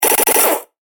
Rapid Gunfire Laser Stutter; Andrew Fleming

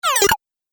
Game Turn Off Machine, video game

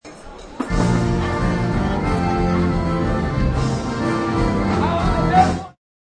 Grmn Prty Music, German party band jamming.; Concerts; Backgrounds

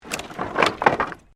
Wood Movement Medium

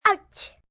Pain Kid Ouch, Pain, child, girl; VoiceBox

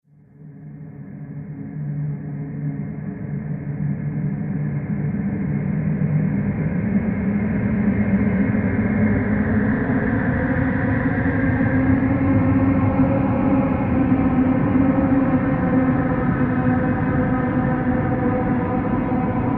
Drone Taken Souls; sound design

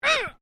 FEMALE GRUNT; Female vocal sounds; Grunts (Sound Effects:Voice)

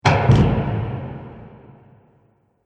Haunted House Door Shut; Creepy Door

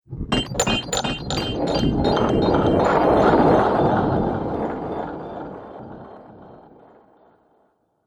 Strange Spooky Atmospherics; Ghost Sound Effects

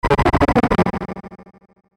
Descend Fast Chopper Static Ring; Descending Sound Effects; Blastwave

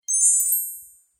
Magical Turning Spell

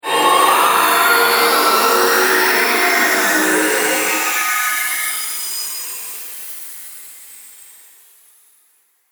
Taken By The Elemental, monster sound

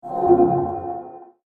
Spook In Passing, ghost sound effect; horror

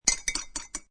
Stirring With A Spoon In A Cocktail Glass; Drink Mixing

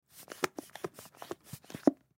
Rubber Ducky Squeak, Rubber Ducky Squeeze; Office; Foley; Miscellaneous Office; Blastwave

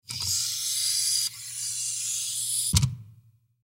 Door Glass Close Hydraulic; Miscellaneous Doors; Office; Blastwave

